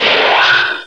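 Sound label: phaser switch; cdromtoday; startrek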